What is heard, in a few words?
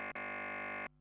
be beeeeeeeeeeeeeeeeee